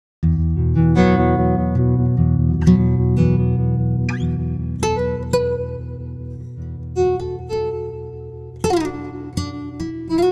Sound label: guitar; ikm sample tank ps ts; pitchshift